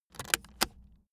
aluminium case close buckle

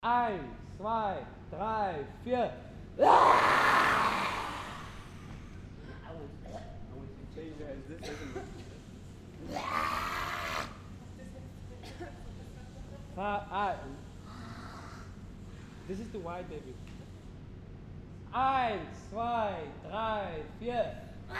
musical dance warm up

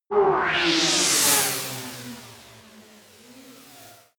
space passing fighting racer

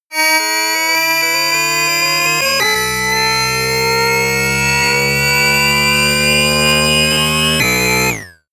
racing car synth

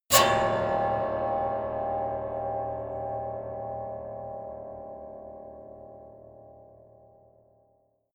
piano squeal

